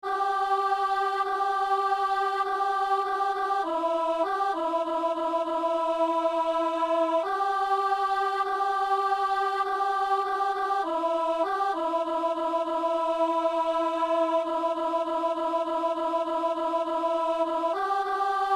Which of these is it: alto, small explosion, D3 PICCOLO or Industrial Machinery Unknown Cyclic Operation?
alto